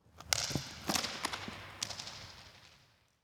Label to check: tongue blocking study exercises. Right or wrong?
Wrong